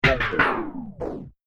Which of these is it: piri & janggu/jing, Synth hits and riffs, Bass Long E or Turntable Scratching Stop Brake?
Turntable Scratching Stop Brake